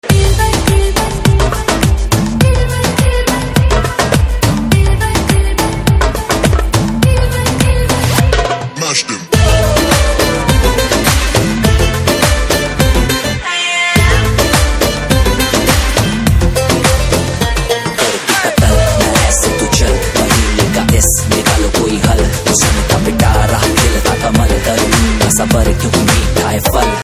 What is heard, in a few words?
Ringtone with BG Instrumental Ringtone; Satyameva Jayate